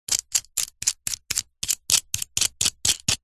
industrial small nut turn tighten on metal bolt several turns